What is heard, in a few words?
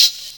CABASSA, Ragga & Dub Reggae; USB Soundscan vol.27; AKAI; CABASSAS